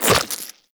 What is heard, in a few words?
Step Goo, Footsteps, Walk; Warframe; Tenno